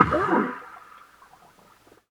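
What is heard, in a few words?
motor burst, Underwater Drill; SFX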